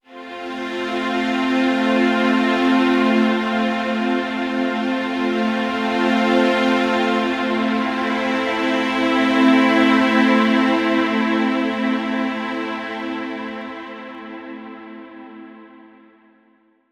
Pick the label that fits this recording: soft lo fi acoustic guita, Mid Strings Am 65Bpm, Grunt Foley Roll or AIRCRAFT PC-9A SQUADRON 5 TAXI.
Mid Strings Am 65Bpm